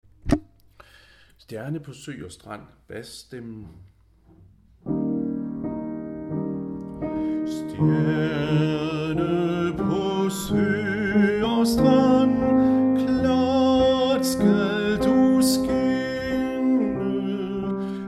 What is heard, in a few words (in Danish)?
bas, harlev koret; Stjerne på sø og strand